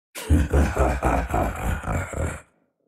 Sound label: reaper laugh